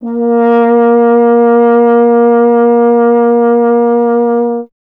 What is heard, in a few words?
F. Horn